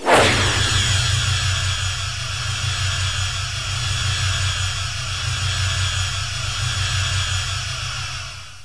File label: TRACTOR